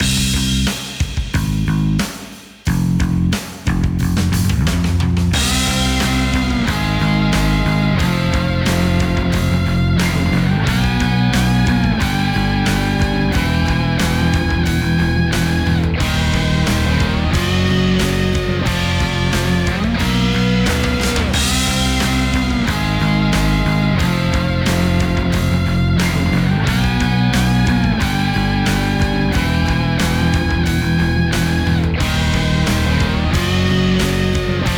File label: Opponent Goal; mario strikers football battle league; Rosalina